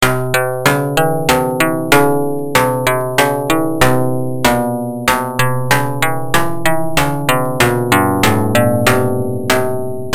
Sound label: pluck